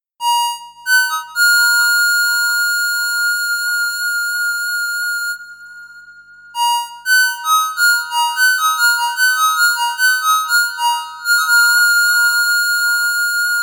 ps3100, synthesizers; morricone

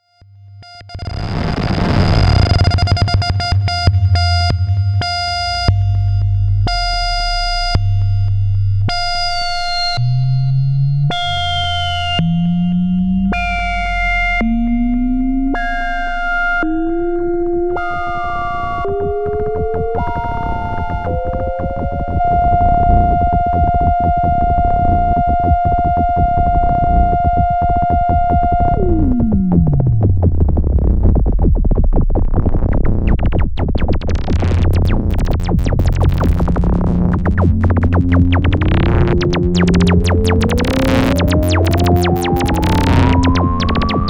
Hydrasynth Ext Mod

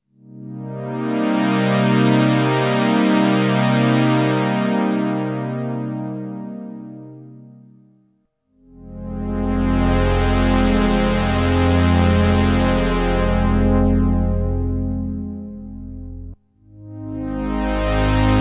Korg Minilogue Chords

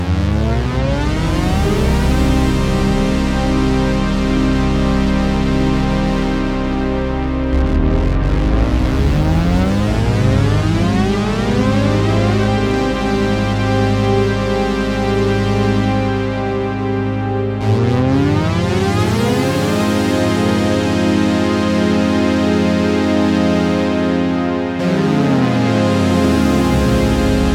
JupiterX Medium Portamento

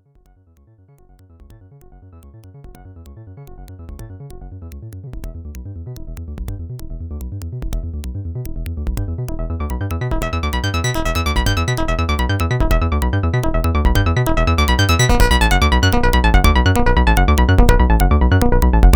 pro1 monotribe sync

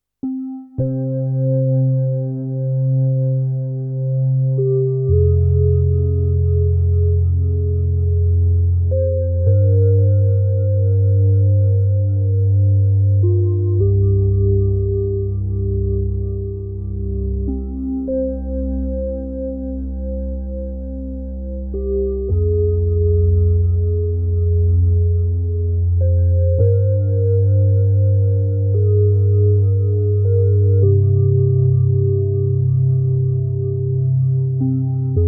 Sequential Pro3 Factory Para Soft

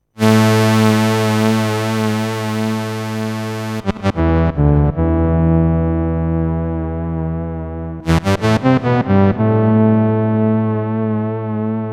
4 VCOs saw solo line, synthesizer

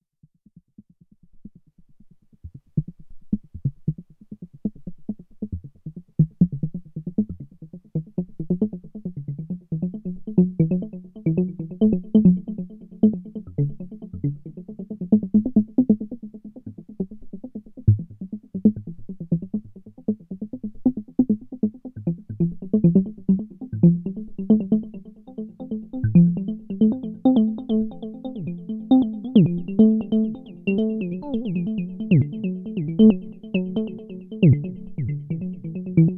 k3 Osc BALance

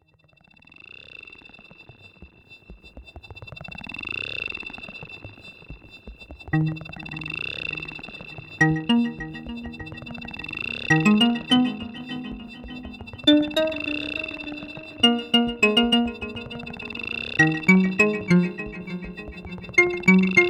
ps3100 delay; bit99

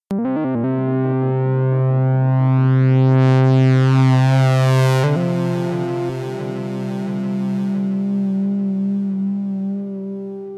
Vermona 14 Synthesizer Solo

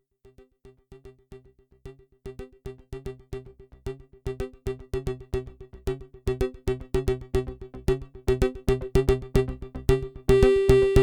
Vermona Mono Lancet Kick Lancet

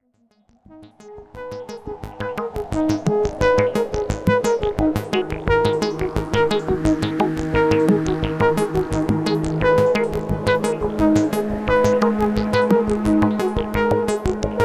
jupiter4 CS60

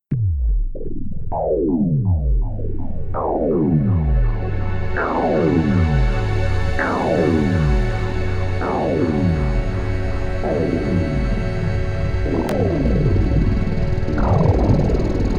SUPER6 FX, synthesizer

